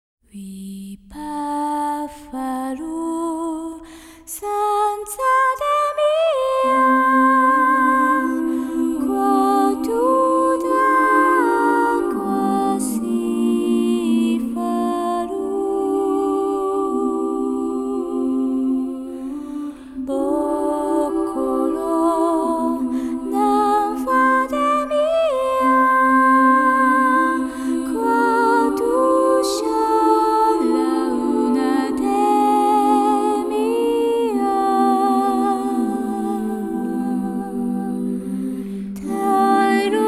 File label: a cappella; ARIA